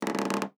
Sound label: Hollow Rubber Creak